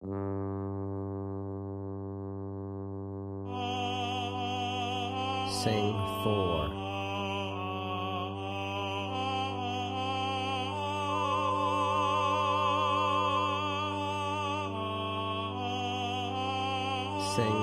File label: Chorale Voice Drone Soprano Tenor; Bach Chorale 1; classical ear training